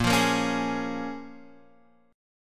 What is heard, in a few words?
A sharp Minor 7th, A sharp, down, Guitar, Half Step Down